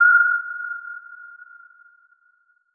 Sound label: Transition Musical Tone Short; Production Element